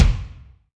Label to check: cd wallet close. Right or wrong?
Wrong